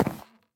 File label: wood, dried ghast; step; minecraft snapshot; block